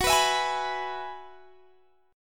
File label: F sharp 7th Suspended 2nd Suspended 4th F sharp down Guitar Standard; F#7sus2sus4 strummed